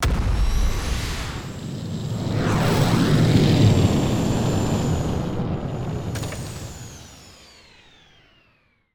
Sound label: Vehicle Jetpack